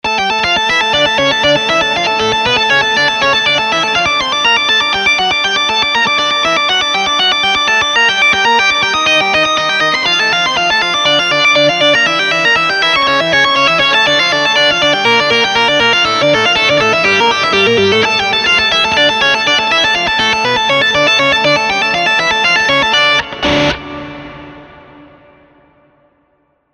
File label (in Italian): Toccata e Fuga